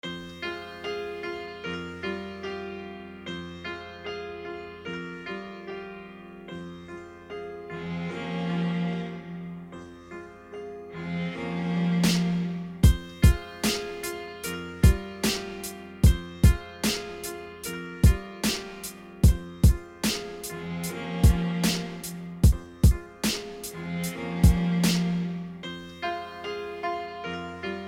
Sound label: Beat